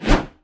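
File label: swing axe, one hand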